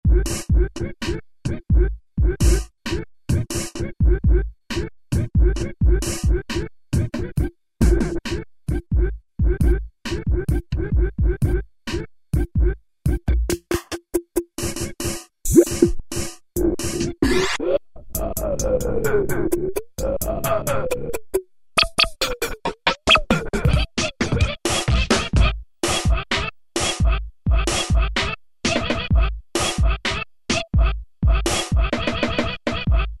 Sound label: Drum, E-mu Morpheus; Skritch